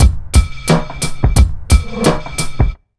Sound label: BEAT, Underground Hip Hop